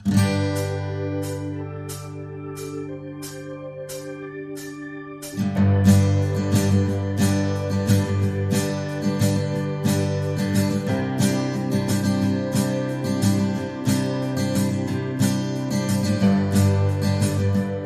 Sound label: Country Drivin